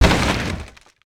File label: wood soft, Impact; Cars; XBOX